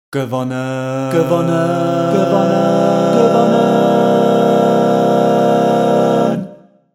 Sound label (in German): gewonnen chor reverb